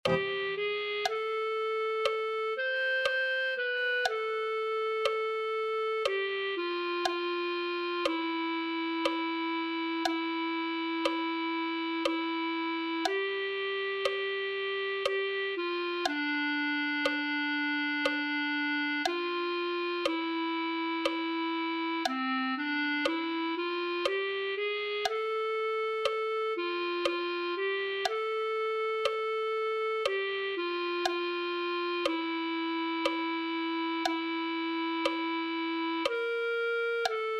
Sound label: clarinet